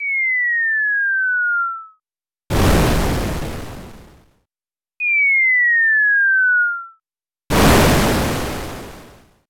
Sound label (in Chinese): bomb, 炸彈 掉落 與 爆炸; Csound orc 製作: 古鴻炎